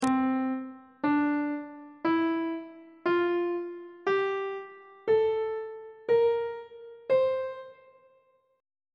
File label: c mixolydian mode on treble clef